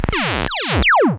NOISE; AKAI S6000 CD-ROM - Volume 1; SAMPLE WAVE MIXING 2; NOISE&SE

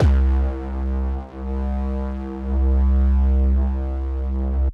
Synth Prophet Bass C; Electronisounds

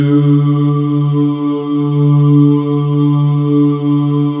DOO D# 2, Synclavier; ILIO

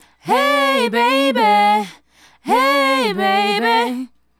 Female Group, Hip-Hop and RnB Vocals

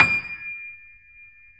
D 6, Keyboards Acoustic, GRANDP ST; USB Soundscan vol.17

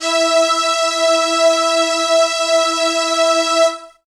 Strings G#3; Total Drum&Bass

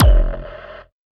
Drums; Distored Reality

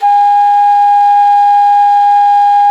QUE G 5B, quena; SoundBytes - Early Patches

